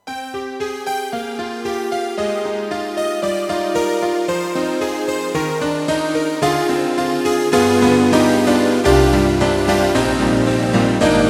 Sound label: YAMAHA MU500 SyPadPno, ピアノ